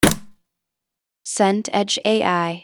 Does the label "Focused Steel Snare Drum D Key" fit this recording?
No